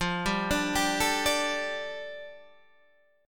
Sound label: A 7th Suspended 4th Sharp 5th, F